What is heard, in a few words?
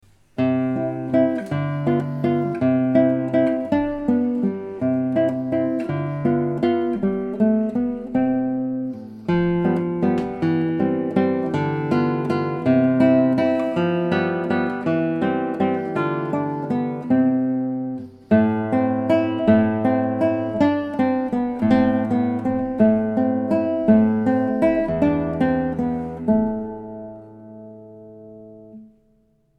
Waltz, guitars; Carulli